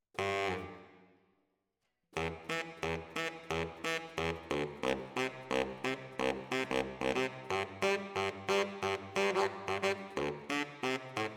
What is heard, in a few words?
ORTF SCHOEPS, piano